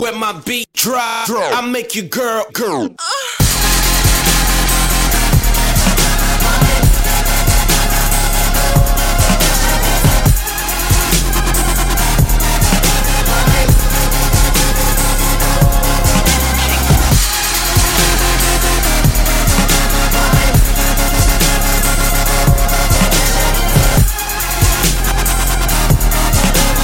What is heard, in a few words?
Dubstep Scream